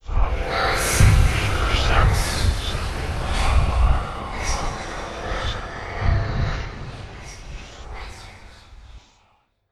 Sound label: ply intro infection; tvirus infection